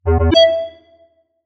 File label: strong minded; message tones; ringtone